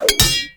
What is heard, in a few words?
Grapple Impact; Valkyr Rework; Warframe; Berserker